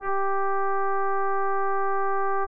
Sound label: bassoon g4